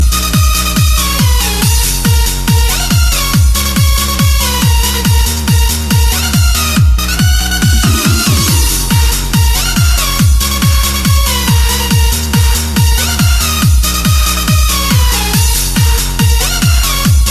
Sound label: Elektro